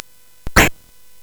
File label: Space Gun